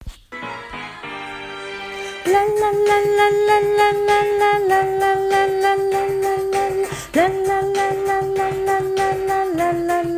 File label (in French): refrain voix; pupitre soprani; voix 1; enregistrements de travail